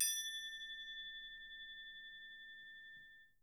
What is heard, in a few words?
Percussion A3, Harp; Miroslav Vitous - Percussion & Harp